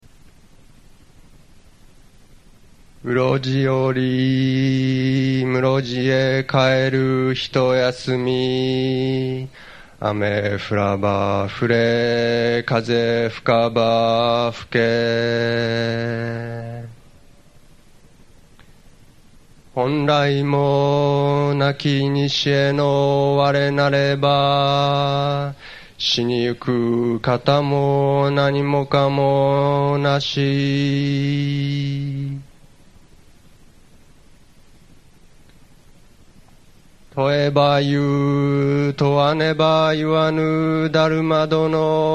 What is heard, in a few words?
only chant before discourse